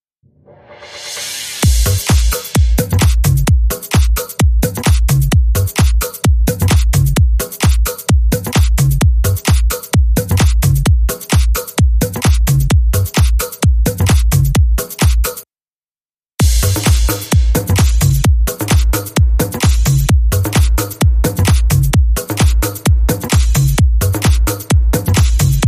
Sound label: Beat